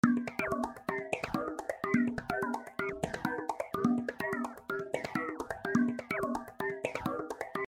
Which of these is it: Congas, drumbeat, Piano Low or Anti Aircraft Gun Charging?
Congas